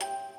musicbox

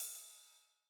ride

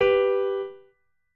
piano

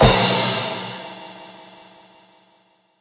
Crash extended; 轻音少女

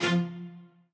strings

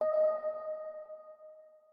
organ

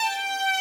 violin

